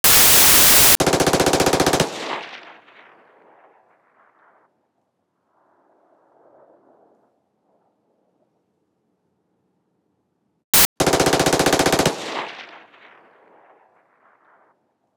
SMG MP7A1 Auto Tail Extra, Battle Rifles & Pistols; Watson Wu